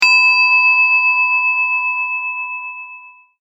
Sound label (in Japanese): りん